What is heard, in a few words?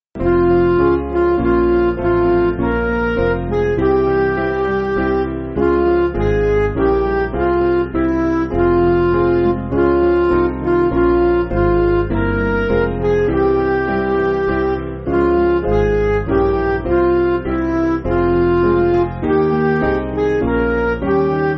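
Music Backing Files for Churches; Atonement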